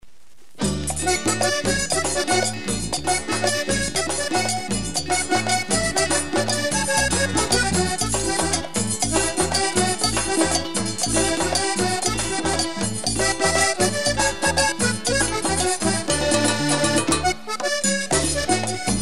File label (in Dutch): accordeon danza